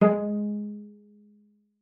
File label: G#3, CELLO PIZZ; Xsample VOL-5